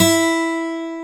ACOGTR

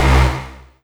Synth Hit C

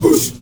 MALE WAR, SHOUT

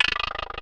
Percussive Hit, contact mic

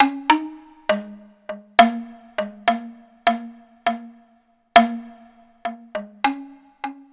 Boo Melody

